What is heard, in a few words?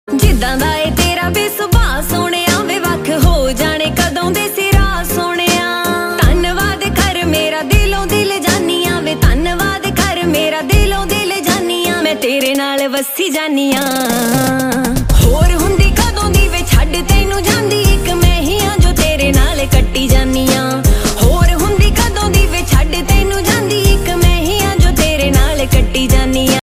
Punjabi Beats Ringtone